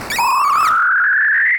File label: Super Cute Bunny Abducted By Ufo